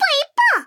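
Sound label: squeak hunt; ragemap2019; giegue; poppo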